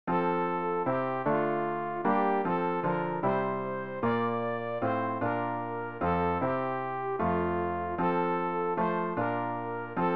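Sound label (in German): sopran, Übehilfen für das Erlernen von Liedern; vor den tueren deiner welt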